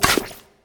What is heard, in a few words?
staff stab; smite; ahpuch skin10